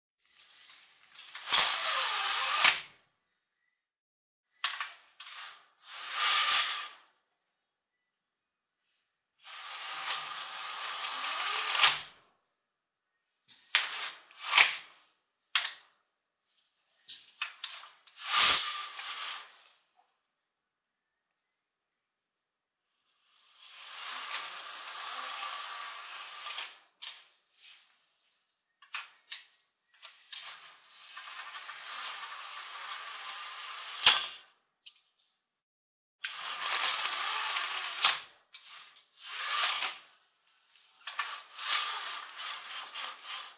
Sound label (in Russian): Zhaluzi